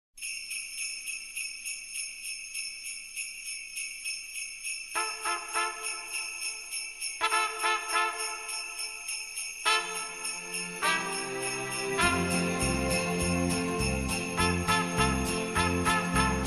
JINGLE BELLS CHOIR AND ORCHESTRA

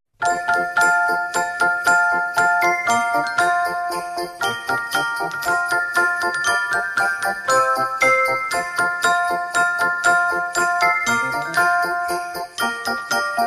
JINGLE BELLS CELESTE

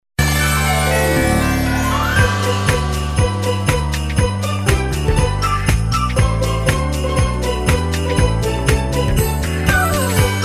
JINGLE BELLS LIVELY AND HAPPY

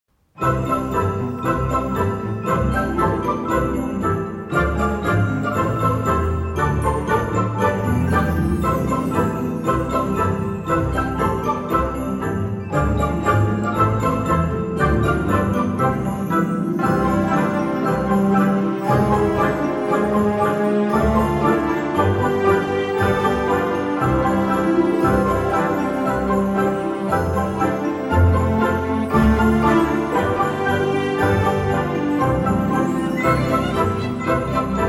JINGLE BELLS OVERTURE

